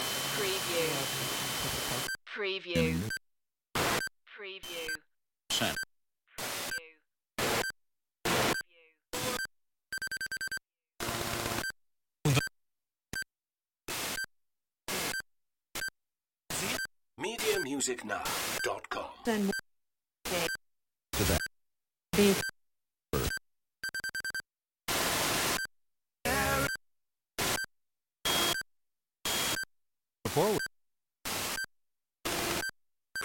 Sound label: Walkman Tuning; Sony